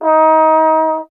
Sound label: Trombone D#; Miroslav Vitous - Solo Instruments VOL-1